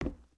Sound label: Foot Wood Boot Walk, footsteps